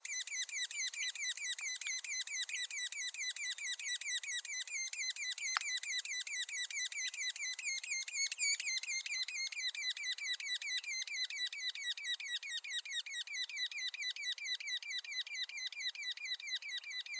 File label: Red-naped Sapsucker, Sphyrapicus nuchalis; woodpeckers